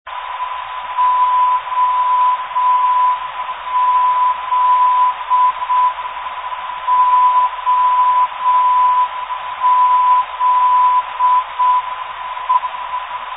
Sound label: oz 463khz, NDB